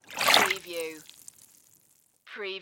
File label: Water Slosh Bucket; Sound Effects Bible